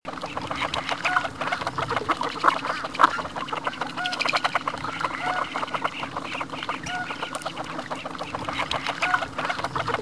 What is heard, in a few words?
White cheeked Pintail; kiwifoto